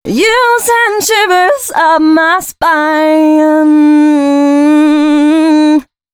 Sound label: female c# 138, hooklines 136-140 BPM